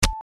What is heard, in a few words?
arrow